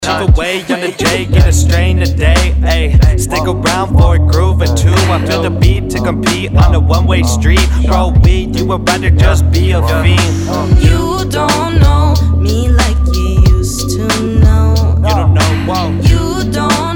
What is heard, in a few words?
Hip Hop Medley